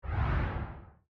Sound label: zoom out; powerball evo